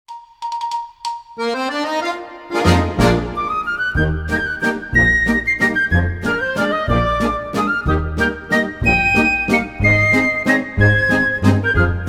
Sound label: circus; autgame